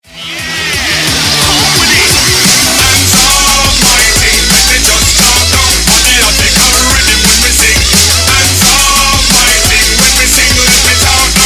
Hard Dance

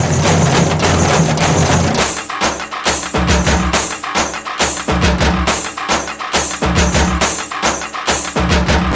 Tamil Folk Beat

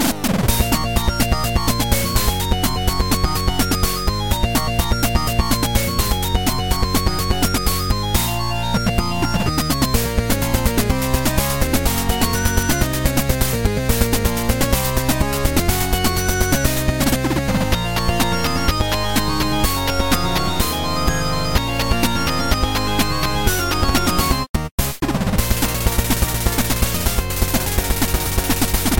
8bit Battle; FF MQ

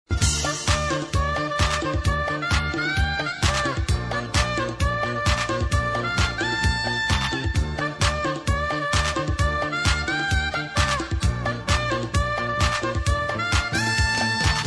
Rap Melody

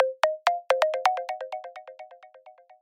Windows Battery

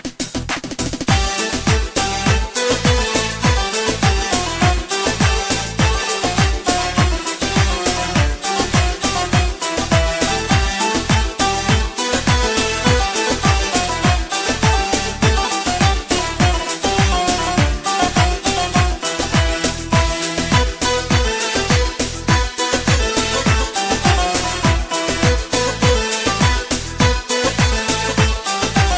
Turkish Mix

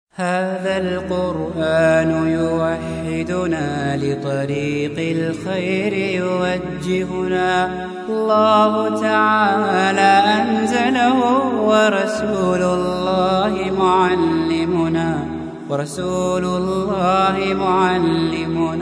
islamic

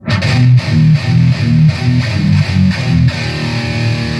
METAL

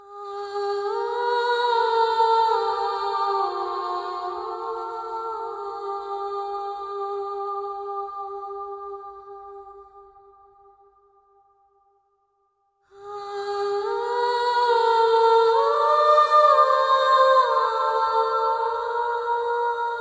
Vocal Part 1

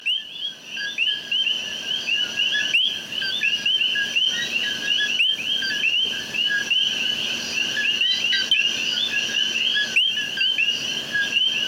Coqui

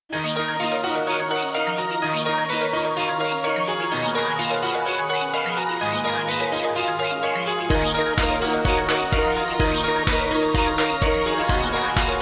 beat

